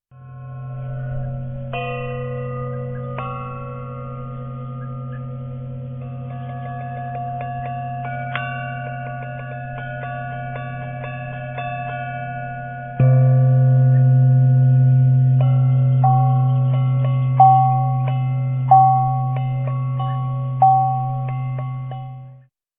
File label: Gong